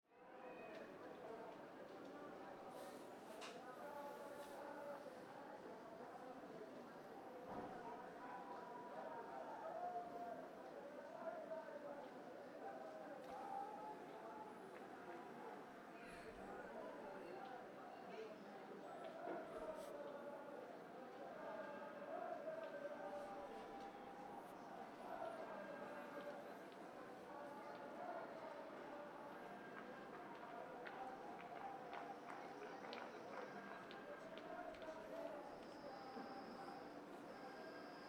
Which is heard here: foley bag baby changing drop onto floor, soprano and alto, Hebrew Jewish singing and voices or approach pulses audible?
Hebrew Jewish singing and voices